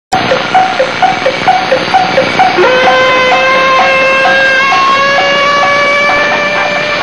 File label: car